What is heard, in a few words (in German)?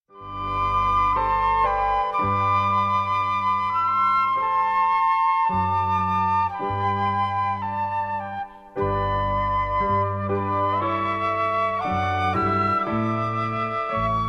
Triosonate fuer 2 Floeten, Tasteninstrument und Bass